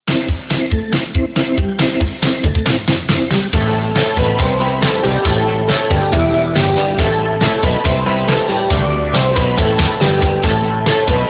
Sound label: ringtone pop rock